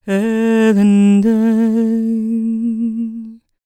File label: MOURN, Vocal Planet World Voices CELTIC; ILIO; Vocal Planet VOL-5